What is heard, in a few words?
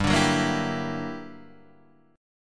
G Minor Major 13th, G; GmM13 strummed; JGuitar